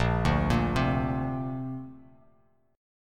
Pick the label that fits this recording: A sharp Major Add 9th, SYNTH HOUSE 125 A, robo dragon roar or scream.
A sharp Major Add 9th